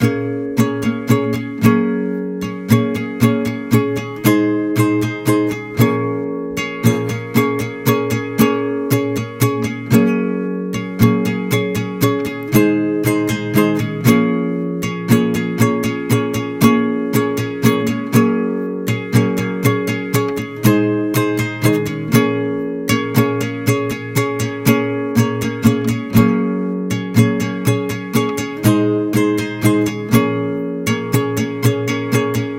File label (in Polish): rytm refren wolniej